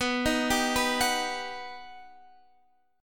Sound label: G Augmented Major 7th